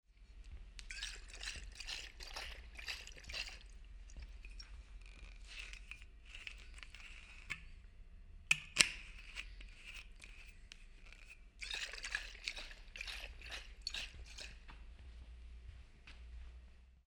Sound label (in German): Binaural Flasche